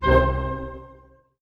STAC C PP, orch stac; Sound On Sound - Peter Siedleczek's Orchestra

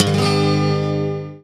STL STRNG CRD; Best Service; World Colours

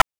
PITCHED NOISE; NES DPCM; MIDINES

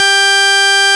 FARFISA G2; Sonic Reality; Vintage Timetraveler

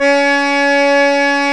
ACCOR C#4; Roland - LA Composer vol. 1

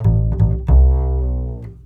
BASS LP, Drum & Bass, 150 BPM; Ueberschall; Drum & Bass Flash Trackz

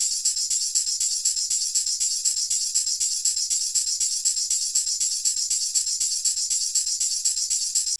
Rhythmic Iron; Drums & Percussion; Electronic Point Blank

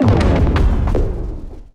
fx crank

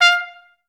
STAC F4, Orchestra; Best Service; Peter Siedlaczek's Advanced Orchestra

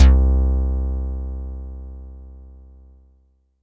Sy Bass

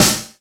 SD, Yamaha RY-10, Drums

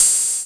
808 HiHat, dry hihats; Quasimidi Rave-o-lution 309

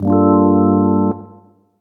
Gmin9, Chords; Electronisounds; Loungin

